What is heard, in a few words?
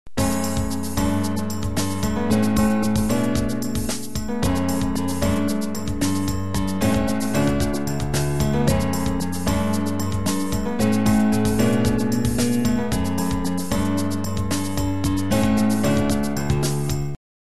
bgm